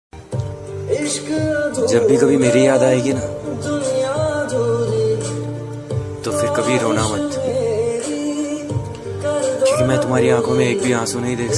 best ringtone hindi ringtone mobile phone ringtone love ringtone sad ringtone